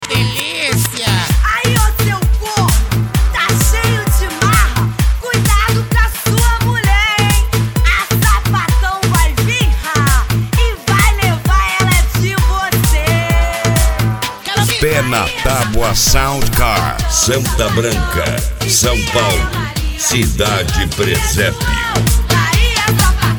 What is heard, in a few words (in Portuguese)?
sound car carnaval